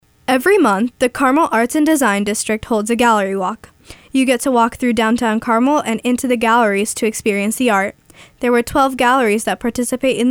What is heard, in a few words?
BEAT